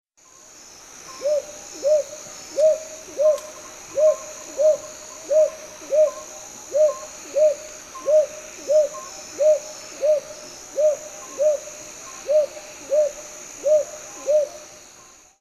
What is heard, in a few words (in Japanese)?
aobazuku, okinawa, koe